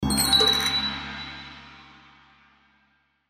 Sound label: Percussion Accent Gong Chimes Wood Block